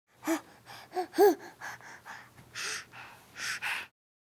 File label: baby playing short; Asian Show Sound Effects